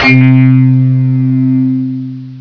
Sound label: TECHBELL; BELLS